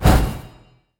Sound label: wild magus bolt cast